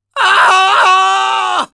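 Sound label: pain critical death, es; Demoman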